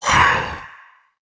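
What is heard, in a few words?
Damage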